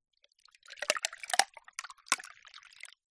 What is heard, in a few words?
food drink liquid pour into glass ice cracks